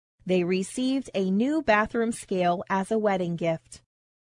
scale

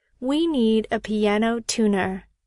piano

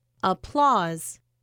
applause

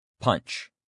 punch

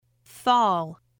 fall

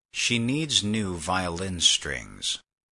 string

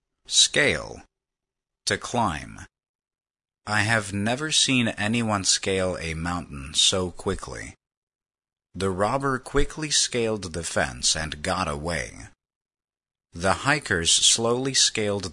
scale

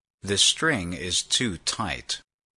string

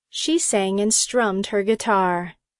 guitar

